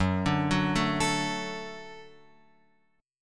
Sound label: F sharp Minor Sharp 5th F sharp; F#m#5 Chord {2 5 4 2 X 5} arpeggiated